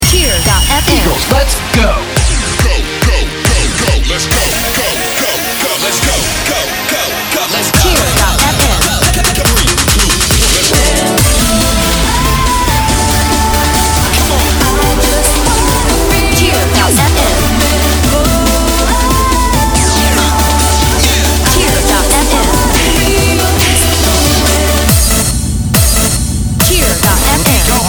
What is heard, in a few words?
Dance with loop